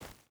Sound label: footstep barefoot forest run; Rust